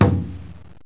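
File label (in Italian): gran cassa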